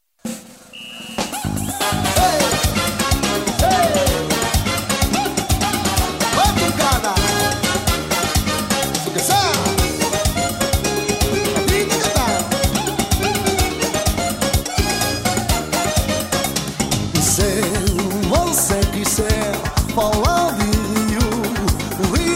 voorbeeld samba

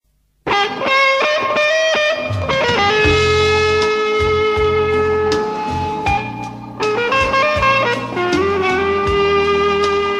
Electrische gitaar fragment

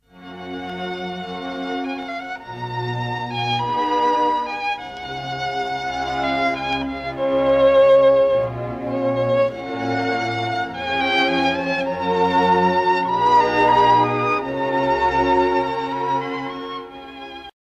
Viool

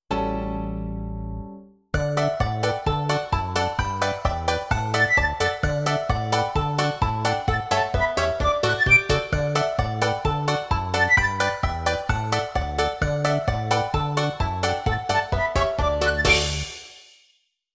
Muziek van het haasje

